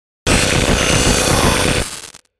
Hiss Rhythm Static Surge; 5.1 STEREO MIX; Blastwave

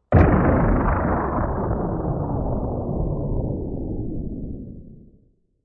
Single bomb explosion SFX with long trail; Bombs

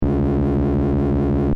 Sci fi machine loop, Scifi Machine: Loop, Short; Control Panels; Stuart Duffield

